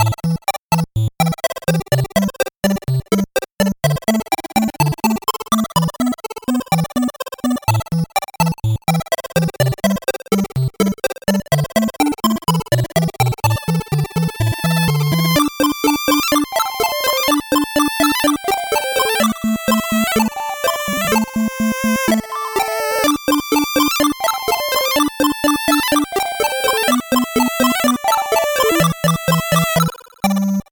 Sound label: 8bit bgm fast